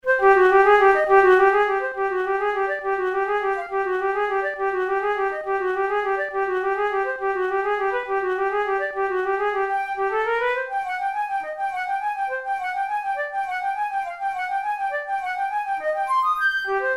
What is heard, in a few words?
studies for the boehm flute; drouet 72